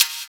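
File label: HIHAT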